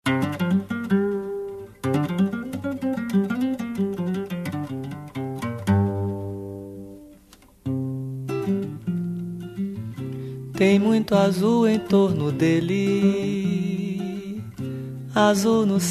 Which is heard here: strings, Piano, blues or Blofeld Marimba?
blues